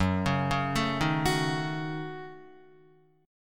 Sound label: F sharp Minor 6th F sharp Guitar; arpeggiated; JGuitar